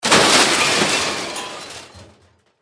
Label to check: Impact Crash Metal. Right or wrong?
Right